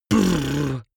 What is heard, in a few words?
beatbox bass drum 808 damped